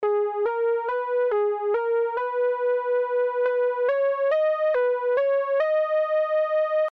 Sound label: Verse Melody